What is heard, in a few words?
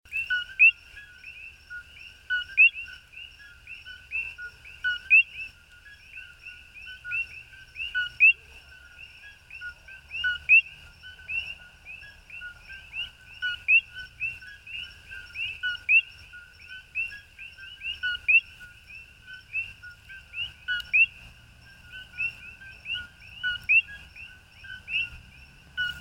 Coqui